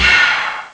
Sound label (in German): fauch; ST-XX Amiga Soundtracker Sample Packs